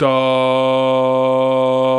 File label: VOX, Male Scats; 90s Sample CDs; Club-50 - Foundations Roland